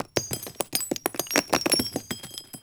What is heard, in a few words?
mg42 multi shell; WAR